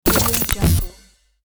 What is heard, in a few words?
glitch breakdown